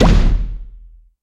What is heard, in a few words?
Item Hammer Hit; In Internal Item Hammer Hit